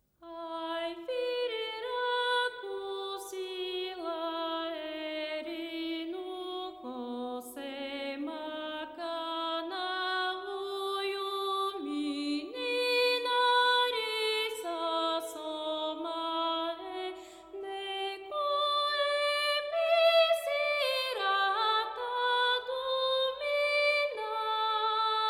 Orchestral Version; Myth: The Xenogears Orchestral Album

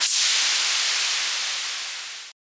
Smoke

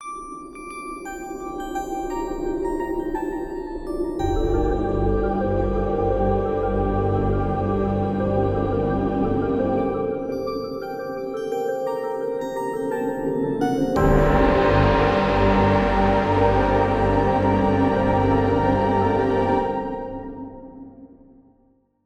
Intro Music; primal light linux macos switch windows xbox one xbox series xs gamerip 2020

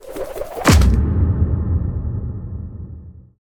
Newspaper Spin

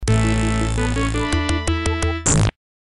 Thief Fail (Sound Blaster); quest for glory 1 original soundtrack

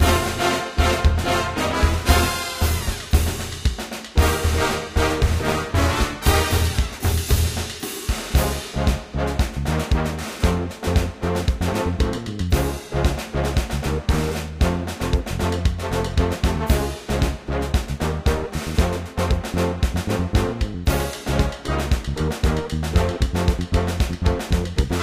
Music; Diner Dash 5 Boom

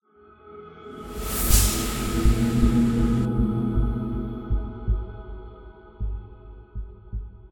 Transition; Everspace 2

